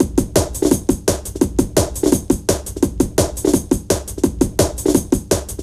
Drum, Drum & Bass; Sonic Foundry; Fluid Dynamics Drum & Bass; Beats & Breaks

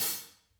hats, Interactive Drum Kits; Sonic Reality

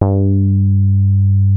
DARK SOFT G2, ANALOG BASS; Music Store - Claudius Diemeter Schwerlos

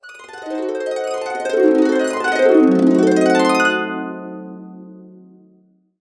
C DIM7 UP DN, Harps

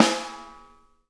SNARES, EDG, Drum Kits

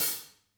HATS R, Drum Kits

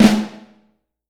Snare Drum

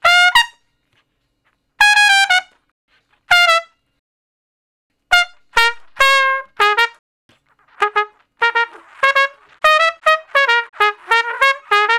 Trumpet Straight (Bb); Solos; Crimson Blue and Fabulous Horncraft 4 RnB; Horncraft for R&B

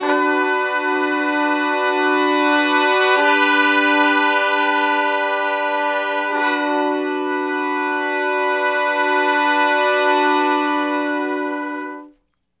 Slo Minor Blus Chords (I,IV,I,I) (B); Sections; Crimson Blue and Fabulous Horncraft 4 RnB; Horncraft for R&B

